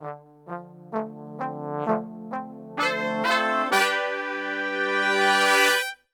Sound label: FANFARE LICK, Brass; Best Service; Brass Super Section